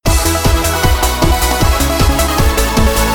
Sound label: diamond notif